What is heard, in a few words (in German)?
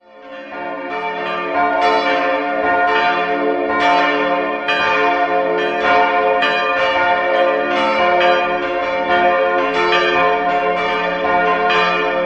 Wachenzell, glocken